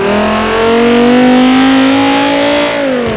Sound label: second, vehicles; gmod; tdmcars; vip viper